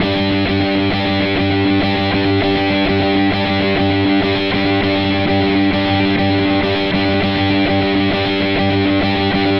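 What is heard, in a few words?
Guitar Pop G major 100bpm; Guitar and Bass; Ableton; Factory Packs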